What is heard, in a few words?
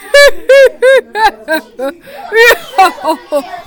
yehehe; grilled cheese